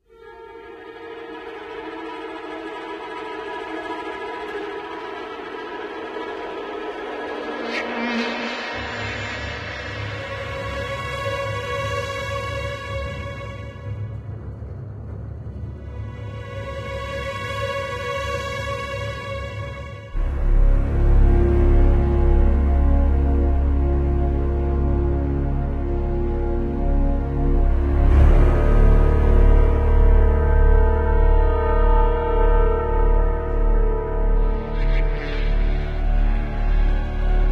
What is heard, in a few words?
Creeping Horror; undefeated windows gamerip 2015